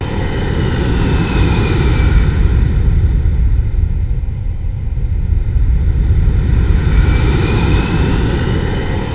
SPACE BASE; Q Up Arts; Dream Experience

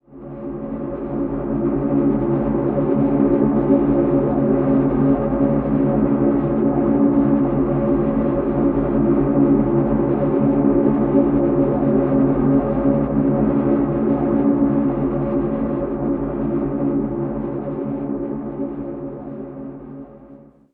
temple drone C#, ancient drones; Zero-G Seismic Frequencies; 90 s Sample CDs

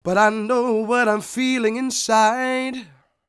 SING W, Vocal Planet Blues & Soul, BLUESY; ILIO

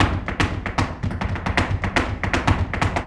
Flamenco, ZAPATEADOSOL

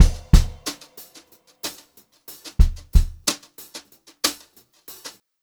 brushed drum loops

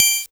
Triangle c Casio SA-1

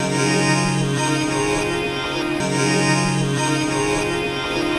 Eight Bit Strings; Sonic Foundry (Sony Creative Software); Myths of Technology

